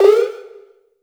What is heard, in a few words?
EFX Alarm Disto; Polyend; Plughugger